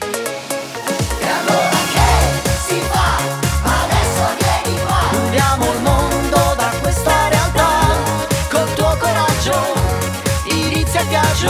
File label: JINGLE